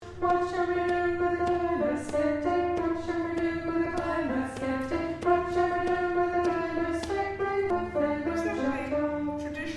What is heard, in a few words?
Alto